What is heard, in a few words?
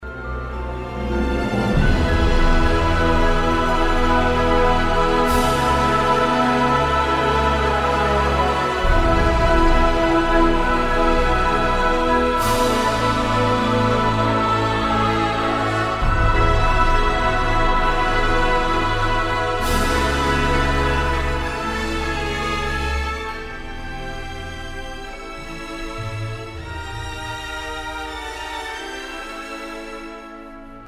Solo